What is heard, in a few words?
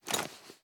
reload fast cloth; gmod